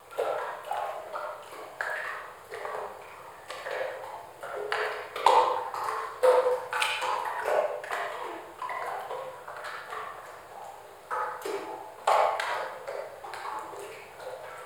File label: Pool Loop